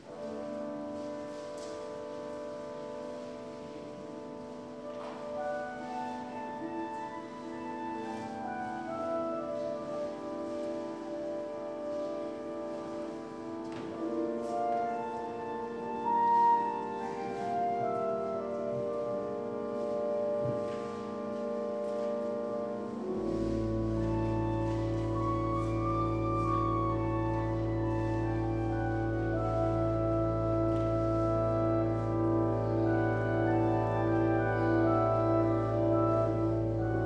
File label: improvisation